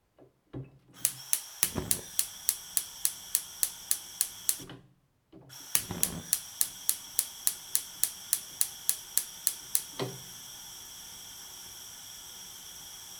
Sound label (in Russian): konforka, zvuk gazovoi plity